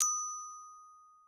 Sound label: gloken